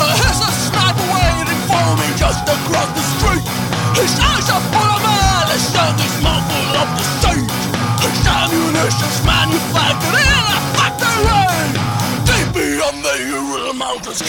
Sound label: Ringtone